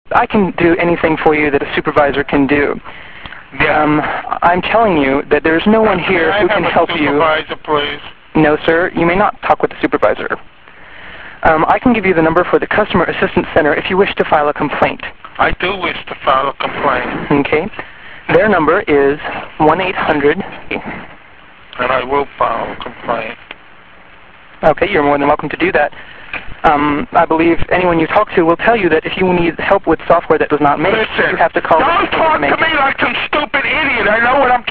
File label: screamer